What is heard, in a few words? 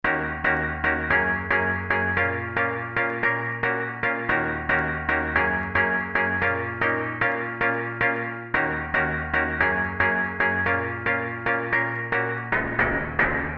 yamaha dx 200